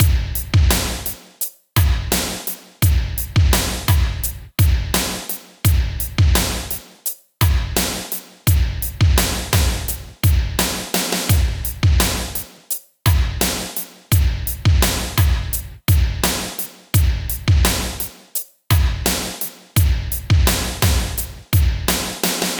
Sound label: 85 bpm drum loop sample A sharp key